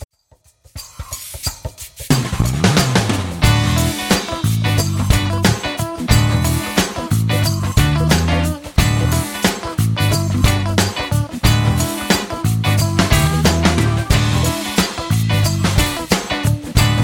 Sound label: Tequila Funk